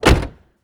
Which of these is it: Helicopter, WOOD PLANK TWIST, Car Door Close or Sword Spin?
Car Door Close